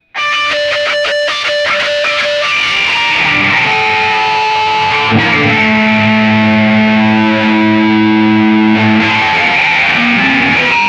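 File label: a guitar riff passed through, grinder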